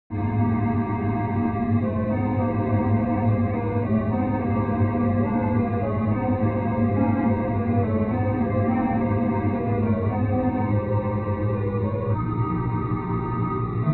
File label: sound of space-filling curves; backtracking; haverkort